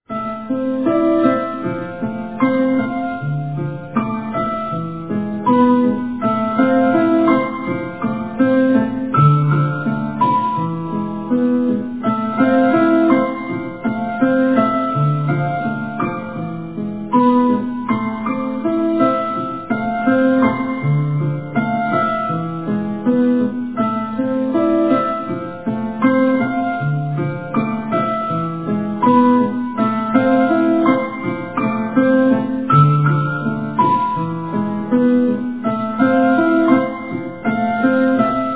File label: Korg Piano Yamaha Piano